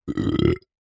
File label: male burp short throaty unforced; zapsplat